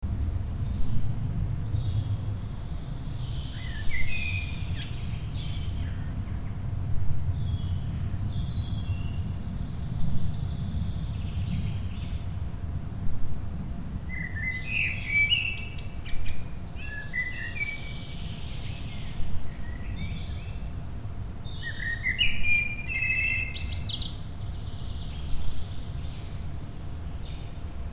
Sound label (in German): laubmischwald